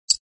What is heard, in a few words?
chip land